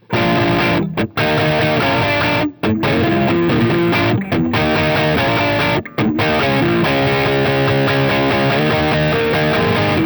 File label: middle distorded, gibson sg; sg goddess